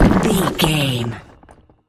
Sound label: rock smashable hit impact